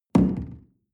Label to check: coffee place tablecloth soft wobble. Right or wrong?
Right